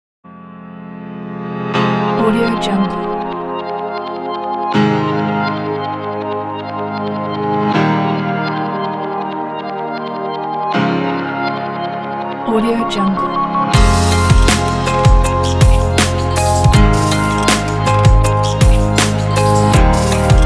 dreamy technology slow beat

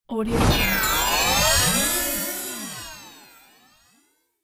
scifi swoosh

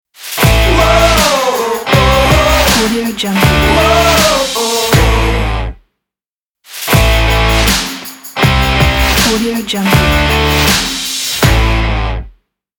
epic sport rock logo

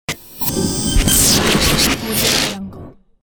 radio imaging

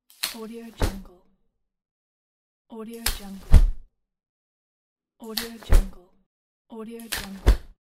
umbrella open

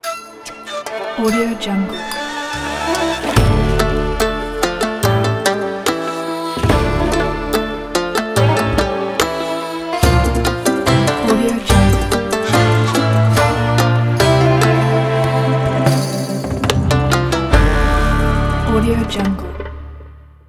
central asia melodic ornament ident

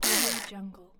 scifi small servo motor burst

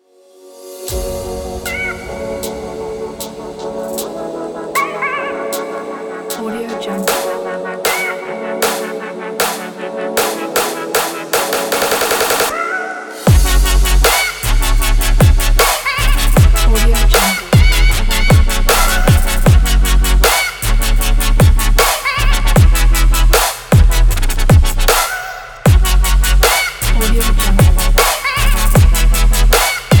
future bass

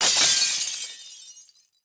rupture glass vehicle window debris medium wave; soundmorph

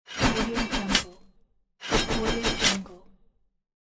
axe throw on chain mail armor

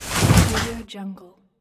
body fall onto bed; yellowcatt

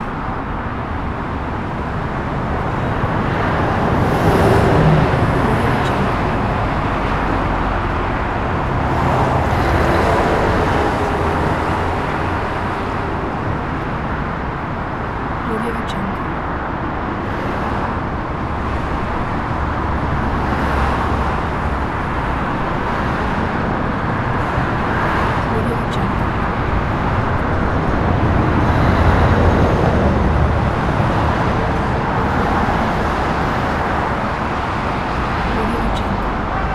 highway ambience

